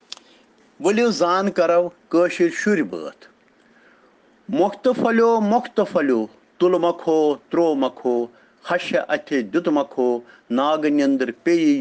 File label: Children Rhyms in Kashmiri